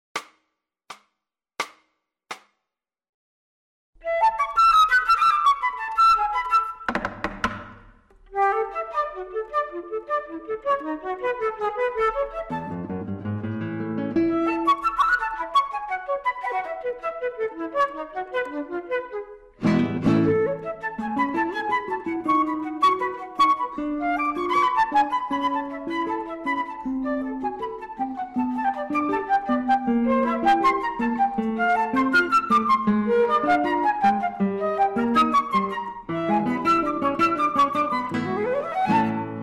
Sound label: guitar FLUTE